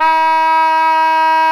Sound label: E 3, richter; SoundBytes - Early Patches